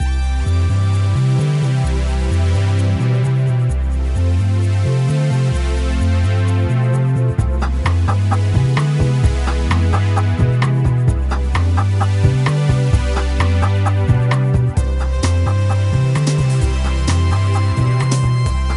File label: Music Circuit Board